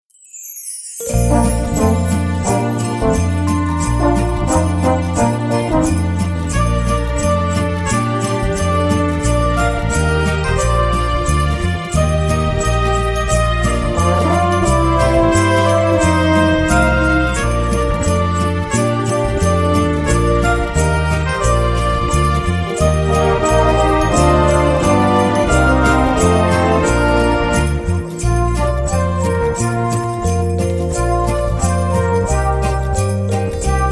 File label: jingle bells; terrasound